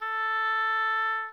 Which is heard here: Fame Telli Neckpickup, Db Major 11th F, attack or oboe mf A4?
oboe mf A4